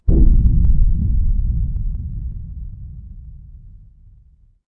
magic scifi shield

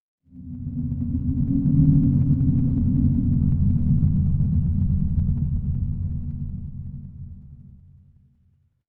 horror ambience dark

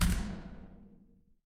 door close

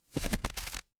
paper fabric crumple

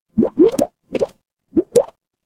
water bubble

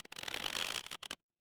cartoon stretch

